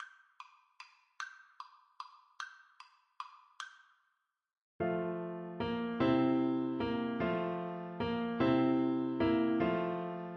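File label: recorder karaoke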